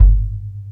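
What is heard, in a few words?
bass drum hit, roots reggae; Sounds Good - Roots Reggae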